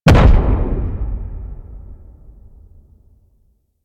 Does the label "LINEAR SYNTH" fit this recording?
No